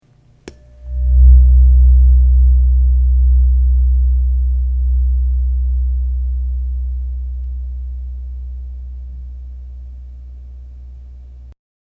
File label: 62.64 hz weighted tuning fork